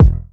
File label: 2000s short hip hop kick drum single shot E key; tuned drums e key